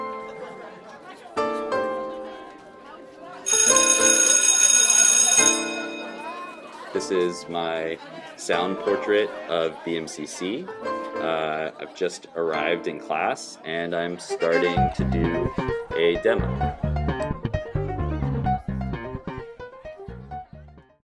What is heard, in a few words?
school sounds